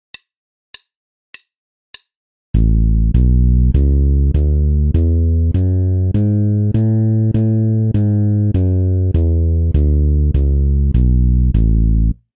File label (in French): Gamme majeure de La, basse; exercices